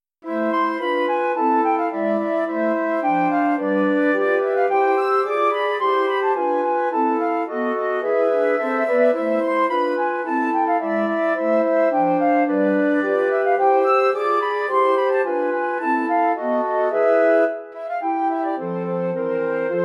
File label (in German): Lied Ohne Worte Op 38 No 2 Quartett